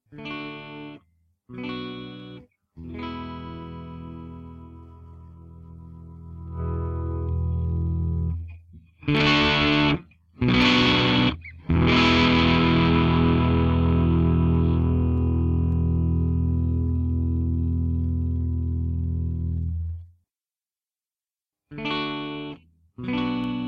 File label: chords both neck bridge